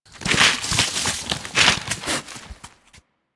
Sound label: Truffle Dig Foley; Enemies; Juggernaut; Warframe; Infested; Truffle Hunt